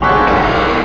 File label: ball drop